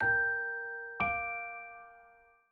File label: Notification